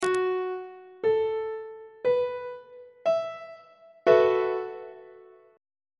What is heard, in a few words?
a double sharp dominant 7th suspended 4th 2nd inversion on treble clef